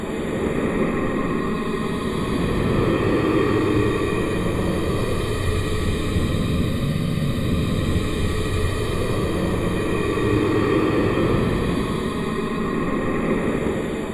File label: DeathZoneLoop; Phasmophobia